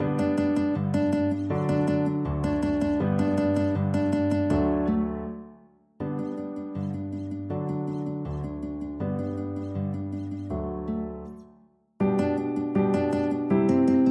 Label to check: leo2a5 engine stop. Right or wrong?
Wrong